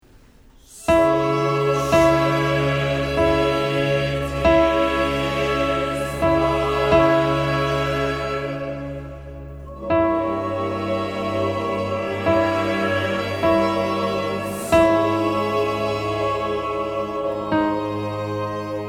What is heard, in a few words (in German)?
Magnificat Nr. 7 Alt